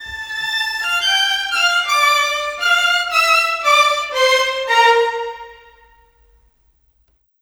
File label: Rock Pop Violin, Orchestral; Sonic Foundry (Sony Creative Software); Orchestral Series VOL-4 - Rock and Pop